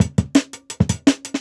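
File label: Jungle Breaks, 169 bpm; Stumbla; Original Jungle Breaks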